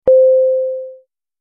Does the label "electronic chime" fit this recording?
Yes